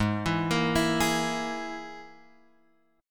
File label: Ab Suspended 2nd Sharp 5th Ab, Guitar Drop D; 6,7,8,9,9,x; down